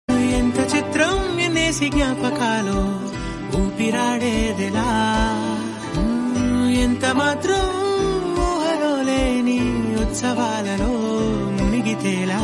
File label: love bgm Telugu ringtone; ante sundaraniki; nani